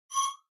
Pop Up Elect Whistl

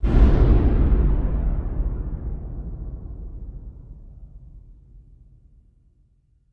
Explo Low Rumble

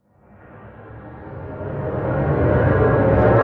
Deep Pitch Fade Whoosh, stinger

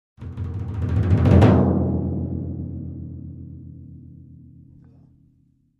Timpani Hands Low Cre; mallets timpani

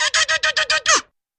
Angry Razz do Do Do Do, vocals

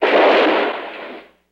Car Bottoms Out Impac

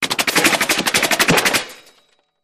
Bullet Impacts Metal

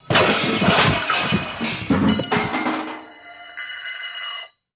Comical Crash Clum, crashes

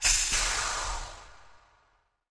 Hydraulic Release, pneumatic

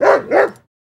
Dog Bark X2 Generic, golden setter

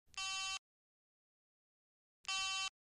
Cell Phone Power On

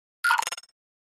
Flash Gear Hollow Met, flash gear

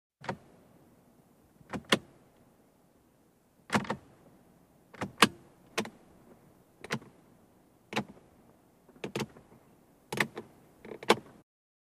Cars Dash Switches

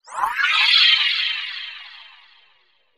Sweep HF Elect Reverb

